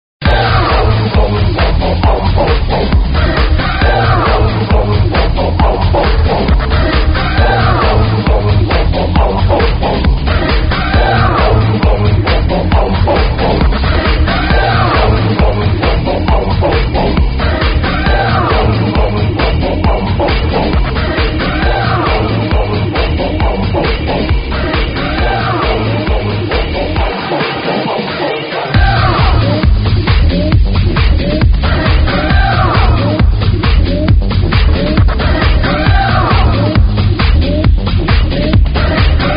kick; multitrax

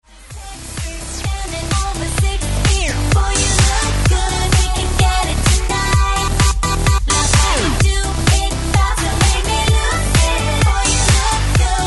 funk; multitrax

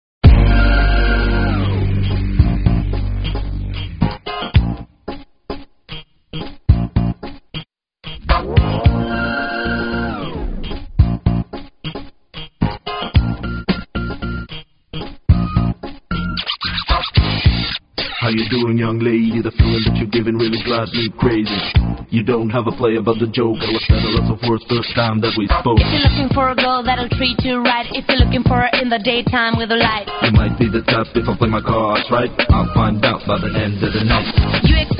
funk ultra hiphop

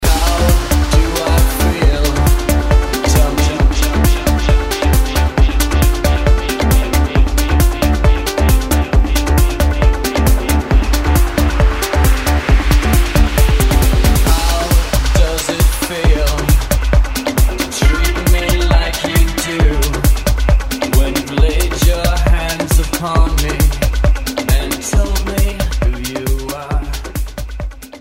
top40; powermusic

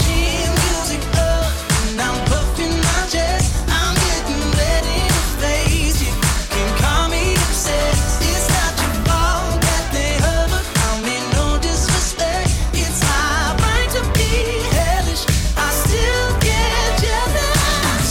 funk; multitrax

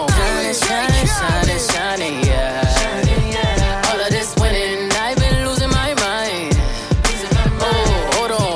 funk; multitrax

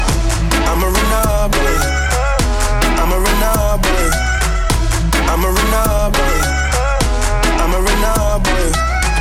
funk; multitrax

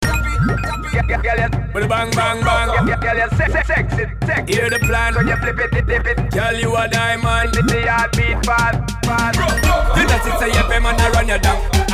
funk; multitrax

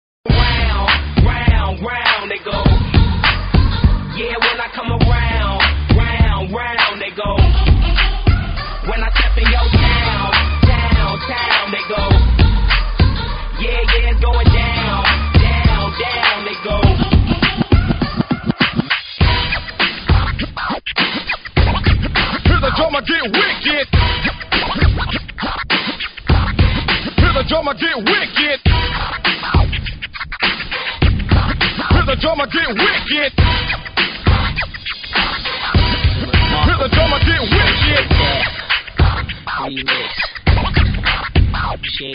funk hiphop

